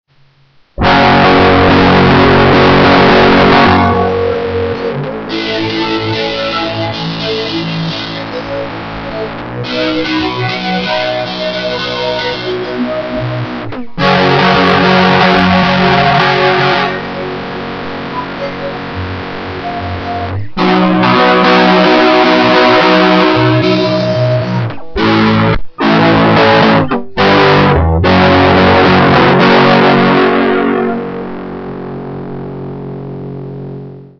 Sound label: steinberger